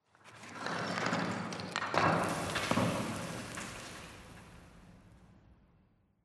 tenement concrete debris; Half Life Alyx; rocks

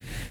step slide, zombie; creatures

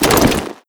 foley step, soldier; combine; soldier charger

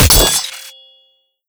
manhack charge announce; npc; Half Life Alyx

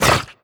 vox turn; headcrab reviver, scripted intro; creatures

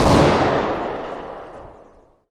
sg556 distant, weapons; Counter Strike Model Pack; CS:GO

